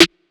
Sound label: SN, Snares